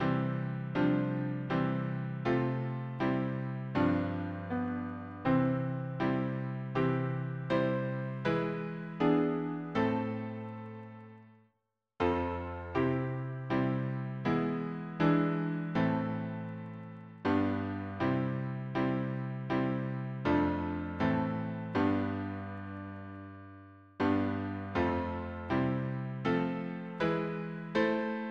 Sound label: harmony piano